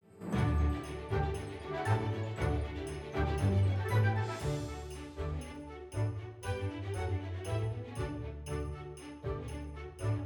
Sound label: Snow Theme Bgm; My Little Pony Magic Princess